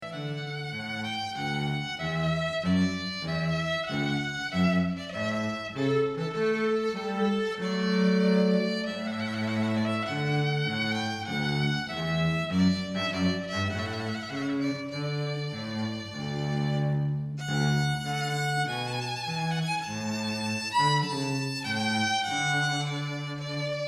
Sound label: Trumpet Voluntary DUO